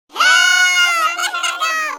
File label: munch; WMBonusXP